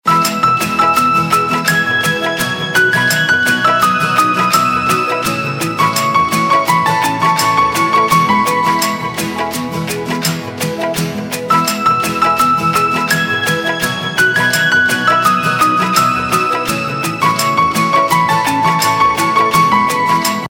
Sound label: Instrumental Ringtone; Bollywood Hits (2014)